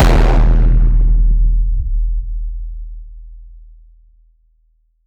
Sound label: vine boom sound effect with a strong bass